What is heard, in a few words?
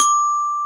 GLOCKENSPIEL D 3; SoundBytes - Early Patches